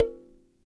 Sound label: Dyna Sansa; Roland LCDP11 Africa VOL-1; Sansas